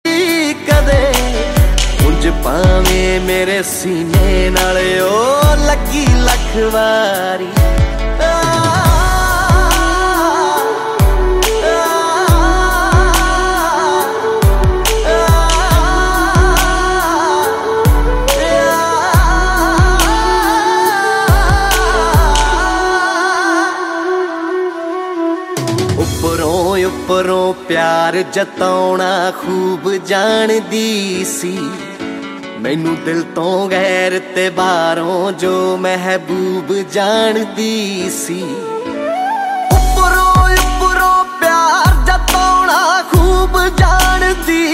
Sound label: latest punjabi songs